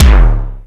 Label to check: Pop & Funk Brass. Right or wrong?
Wrong